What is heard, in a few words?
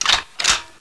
awp deploy, weapons